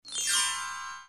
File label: down gliss performed on xylophone